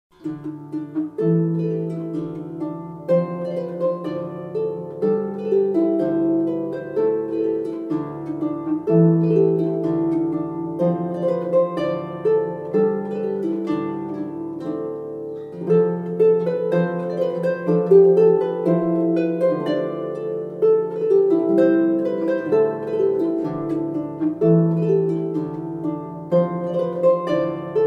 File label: celtic chanters tune